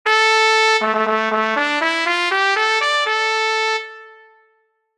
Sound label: trumpets